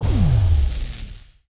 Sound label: boo power node